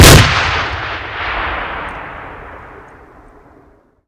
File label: explosion; SuperPower 2 Redux V7A; unit